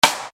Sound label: SD, Yamaha RY-30